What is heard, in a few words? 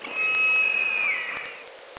Eagle Record